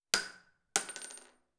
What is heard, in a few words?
empty bullet shell fall; mechanical